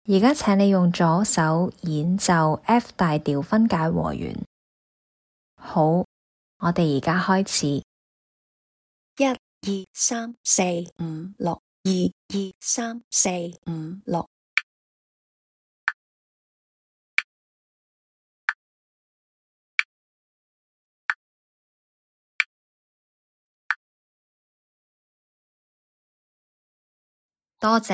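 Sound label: f major broken chord lh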